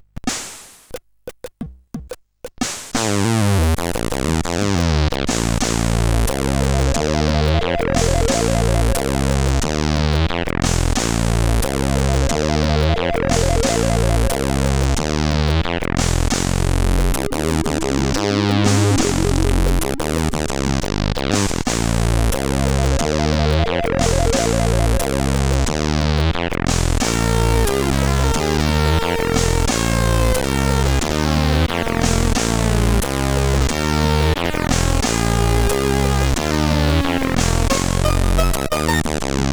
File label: Funktrap, CSG8580R5; sid; hvsc